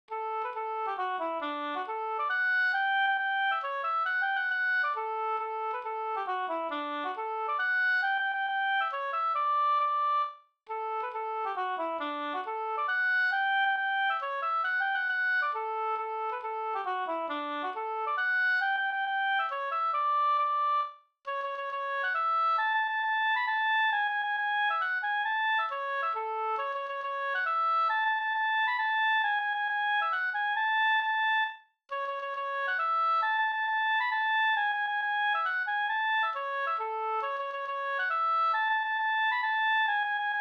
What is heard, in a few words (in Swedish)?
hambopolska